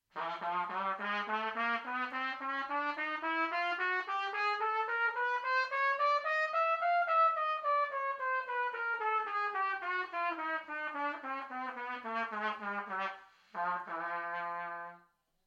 chromatic scale

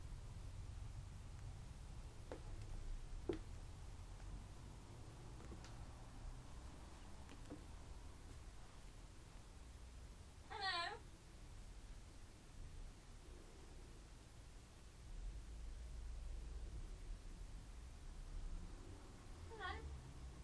parrot in bookshop eastbourne

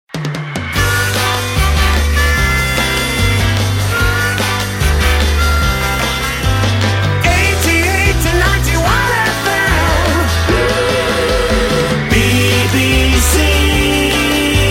60s rock theme; radio 2

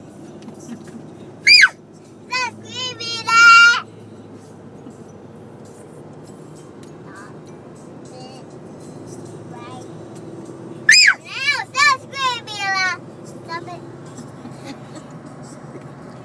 stop screaming

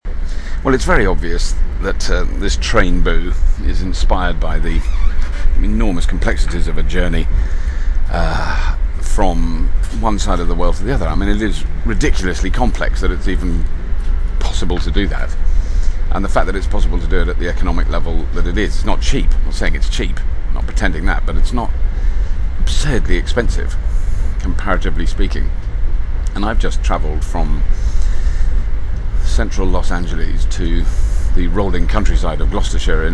train; globe stumble

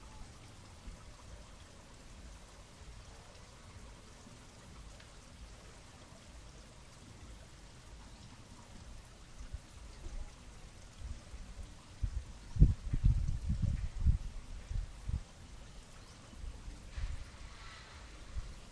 thai pool atmo

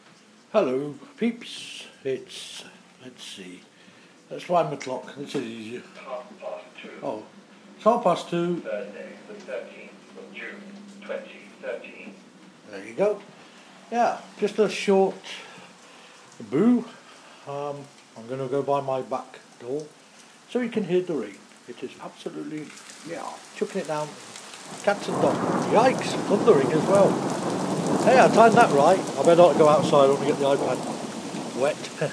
rain and thunder

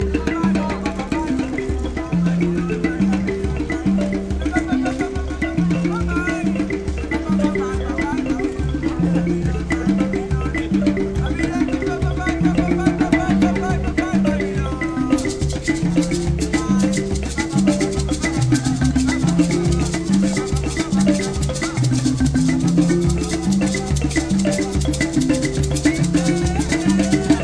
African musicians in Piccadilly Manchester

